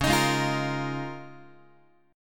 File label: C sharp 6th Add 9th C sharp down Guitar; strummed; C#6add9; JGuitar